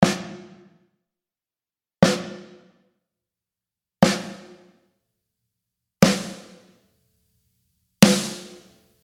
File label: E Snare